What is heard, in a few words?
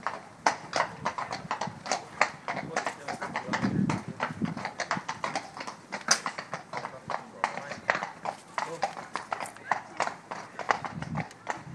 sights sounds of turin mounted policemen